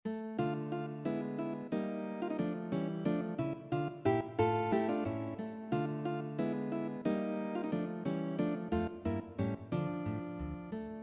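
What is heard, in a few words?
2 guitars, guitar duet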